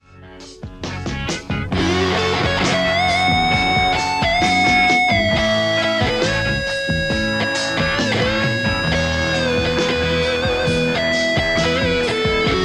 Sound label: lespaul; poulin legion